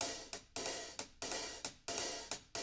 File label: HIHAT LOOPS; Living Drums